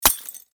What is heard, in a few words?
Lightbulb break shatter explosion